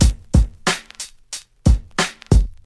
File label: 91 bpm 90s hip hop breakbeat sample A key, kick tuned to the A note